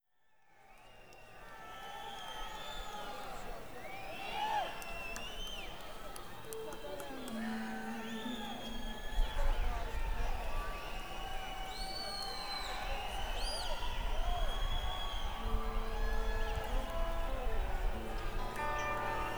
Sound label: Tuning